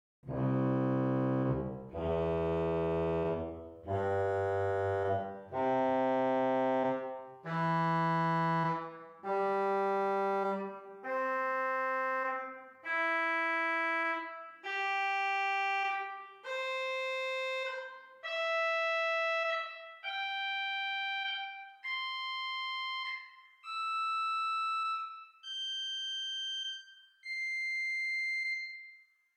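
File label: Swell Trumpet 8 arpeggio, organ; Hauptwerk; St Annes Moseley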